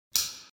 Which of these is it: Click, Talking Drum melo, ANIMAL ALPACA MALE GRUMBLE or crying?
Click